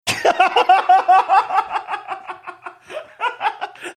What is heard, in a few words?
laughing